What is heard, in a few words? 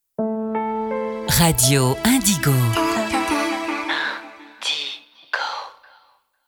indigo jingle